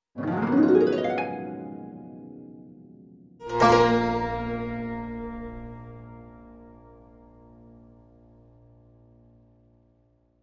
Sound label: Hit